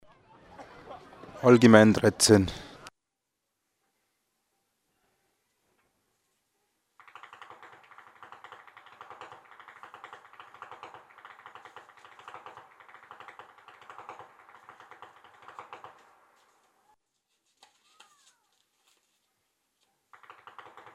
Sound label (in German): schnalzen; eichet; saaldorf